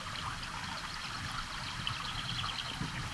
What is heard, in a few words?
Waterway B Dark